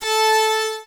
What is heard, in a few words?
SYNCLV LEAD; Greytsounds VOL-1; SYNCLAV TRAX